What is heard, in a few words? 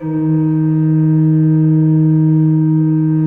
D2, Cathedral Organ; ILIO; Propeller Islands Cathedral Organ